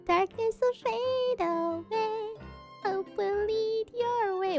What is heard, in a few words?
Singing; IRySinging